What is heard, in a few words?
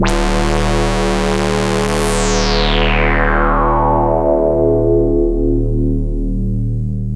LUCKY SWP, SYNTH; Keith Emerson Signature Series